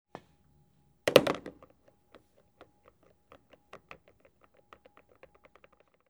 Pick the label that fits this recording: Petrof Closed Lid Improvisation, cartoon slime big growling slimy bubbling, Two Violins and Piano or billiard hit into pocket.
billiard hit into pocket